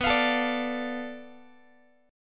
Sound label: B Minor 7th Flat 5th B Guitar; Bm7b5; strummed; JGuitar